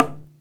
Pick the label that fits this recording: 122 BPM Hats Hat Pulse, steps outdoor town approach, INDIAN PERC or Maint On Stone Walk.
INDIAN PERC